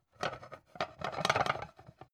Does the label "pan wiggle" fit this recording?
Yes